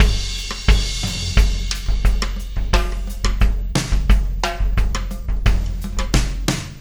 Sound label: Dip Beat